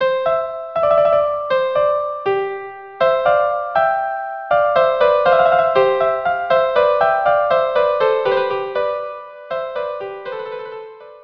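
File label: Loure violin violin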